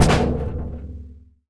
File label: water catcher large deploy; Rust